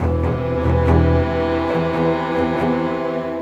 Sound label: Cinematic Strings, Orchestral; Sonic Foundry (Sony Creative Software); Orchestral Series VOL-3; Cinematic 19